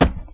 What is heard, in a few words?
wood place; everness